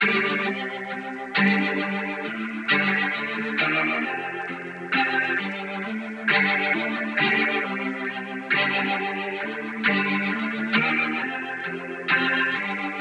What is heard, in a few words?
Melody Loop Guitar, Gm, 67 BPM, LoFi Ambient